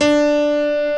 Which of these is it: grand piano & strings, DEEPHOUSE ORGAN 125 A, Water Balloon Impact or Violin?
grand piano & strings